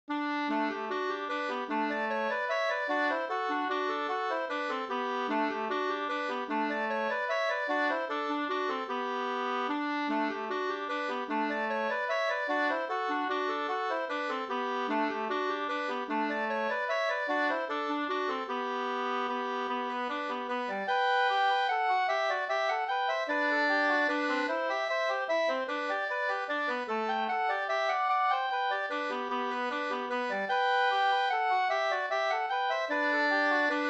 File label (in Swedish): vals; Gasvikarn